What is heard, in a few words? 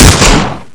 g36 fire